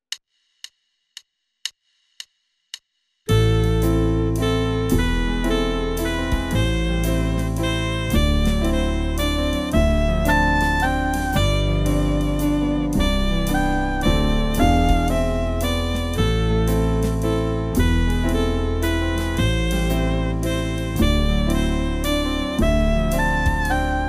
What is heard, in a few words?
Waltz full ensemble